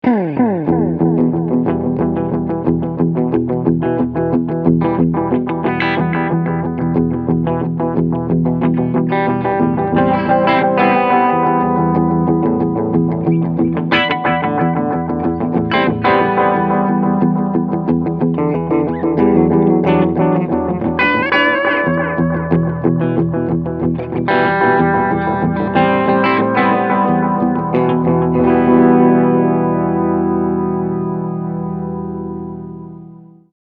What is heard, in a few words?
Echosex Medium Delay